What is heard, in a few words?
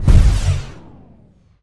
space turret fire; Quake 4 Alpha; weapons turret